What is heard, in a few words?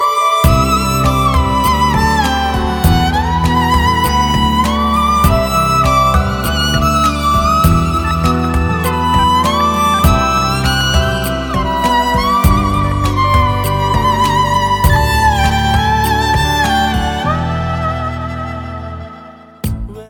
Music